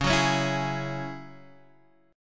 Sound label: F sharp 7th Suspended 2nd C sharp